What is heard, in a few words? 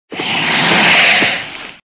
pterodactyl; openspace3d